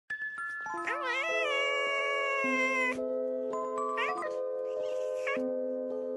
wizard cat eating pizza; green screen; audio meme

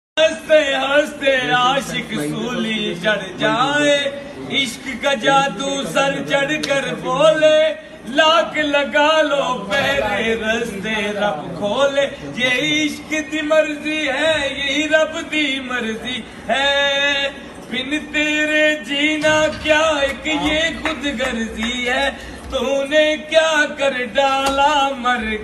2 guys in jail singing; audio meme

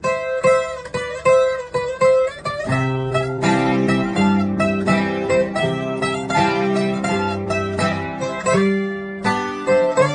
playing guitar; messi; audio meme

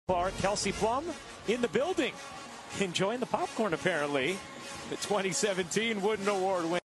eating popcorn; kelsie plum; audio meme

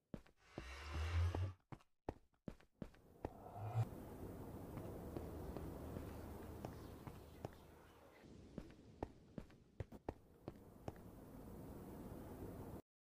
nether portal transition; minecraft; audio meme

